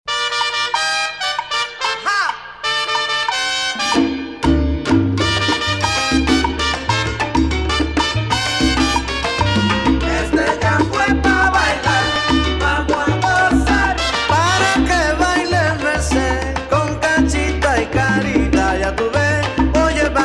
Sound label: Yambu; 中級; 課題曲